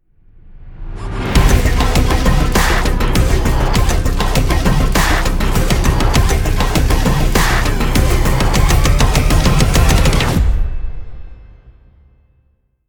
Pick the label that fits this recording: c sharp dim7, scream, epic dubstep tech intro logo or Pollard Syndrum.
epic dubstep tech intro logo